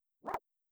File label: sword sound; Model2; physical-model-of-a-sword-sound